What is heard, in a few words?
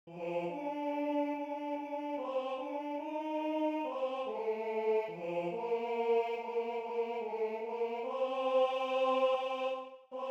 Tenor I; Untreue